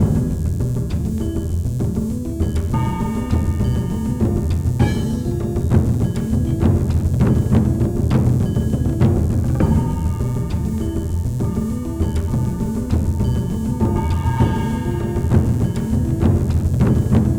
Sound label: Music Boss Hideout Boss Fight Intensity Strong; Hideout1